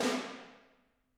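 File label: snare drum, Drums; Bob Clearmountain Drums I; SD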